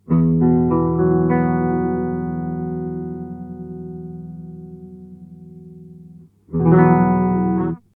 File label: Sub Octave tone; Mooer Tender Octave X2